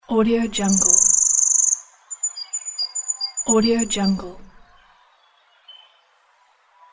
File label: birds singing